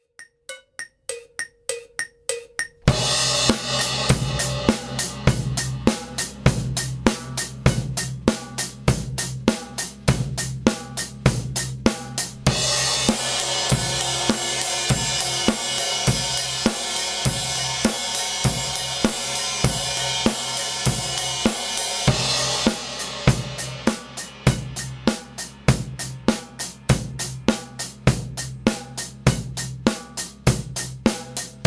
Drum

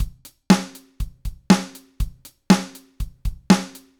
Drums No comp

